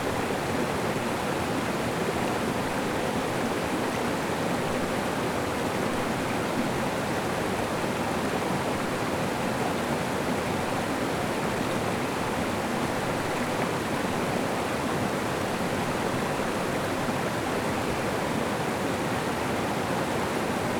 Streams, Ocean and Stream; Discover Oregon; 2014 McKenzie

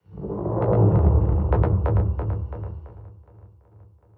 Movement; 99Sounds; InterSpace

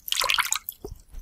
sink water; Foley; Kenney's Sound Pack

